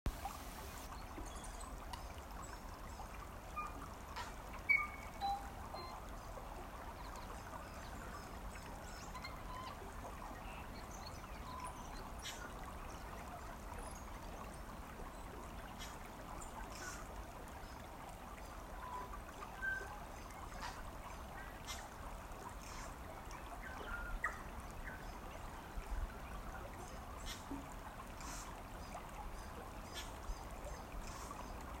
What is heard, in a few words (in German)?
Neuseeland Klang